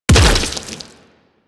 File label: Golem Grenade Explosion; Warframe; Attacks